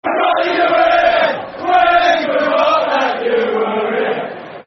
Football Chant; We Forgot That You Were Here

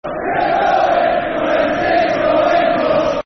Football Chants (Soccer Songs); Going Bust; Barnsley Bfc

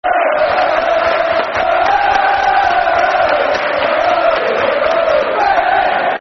Football Chants (Soccer Songs); Reading

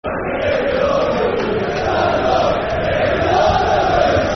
Stand Up If You Love The Blues, Football Chants; Soccer Songs; Everton Efc

